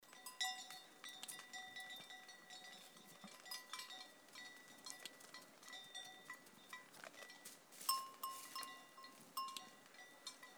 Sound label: Goats; MyNoiseMics